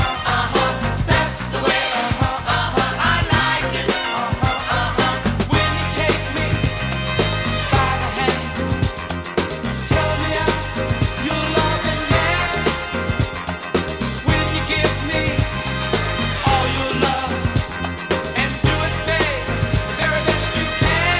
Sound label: disco